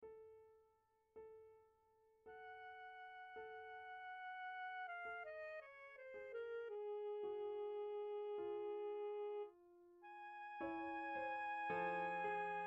Vocalise Etude Piano; SZYMANOWSKI; Saxophone Solo

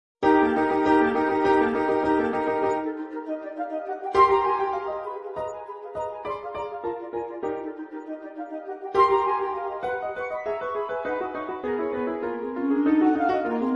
The Market at Limoges Flute Quartet w Piano; Pictures At An Exhibition

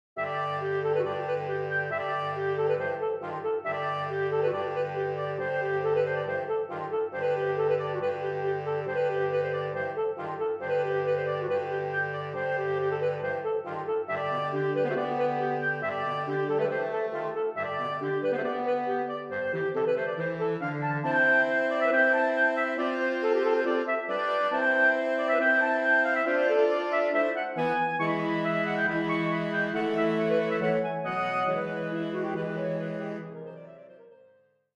In Celtic Lands Highland Bagpipes; Duhamel

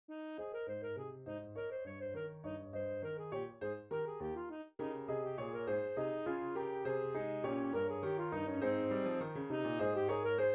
Adagio and Allegro allegro only Alto Sax w Piano

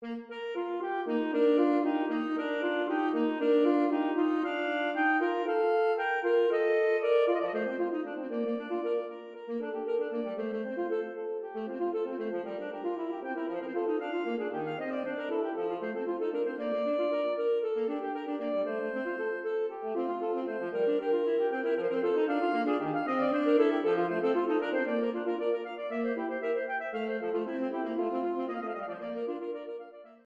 Duet Allegro; WF Bach; Saxophone Duet

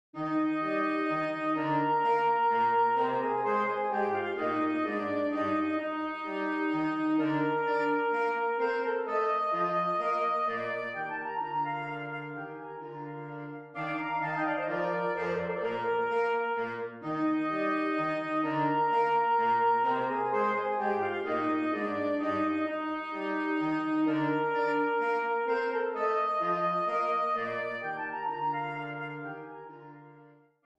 Divertimento in Eflat Menuet; Haydn; Saxophone Quartet

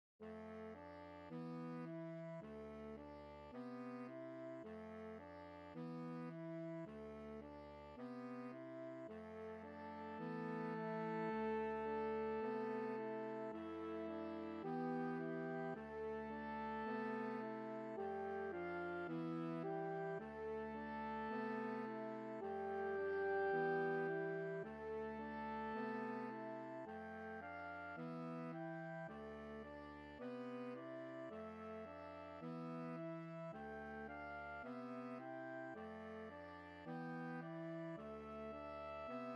Andante Suite for Saxophones; Stravinsky

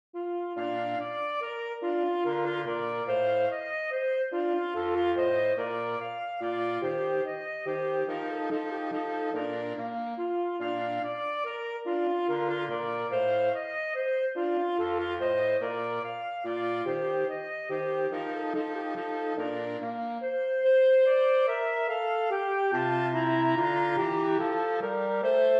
Divertimento in Bflat Menuetto; Mozart

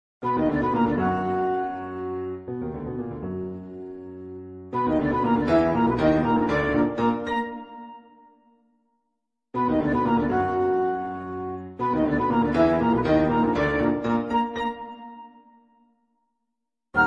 Flute Quartet w Piano; Gnomus; Pictures At An Exhibition

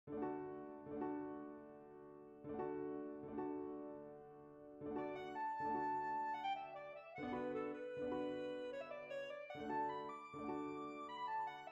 eflat clarinet; HOLMES Augusta; Three little pieces 1

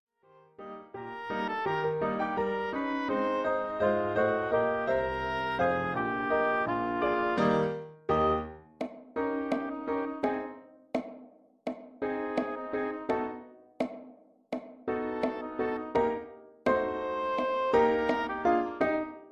Ragtime Dance STOP TIME SECTION

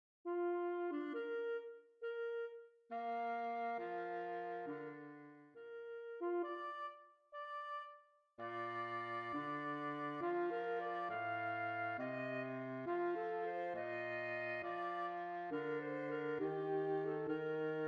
Rossini Duo for Cello and Bass Andante Molto

